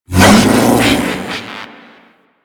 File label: Ape Prelate Attack E; Enemies; Warframe; Proto Infested